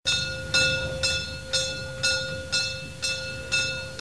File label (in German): Laeuten